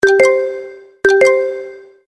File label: Alert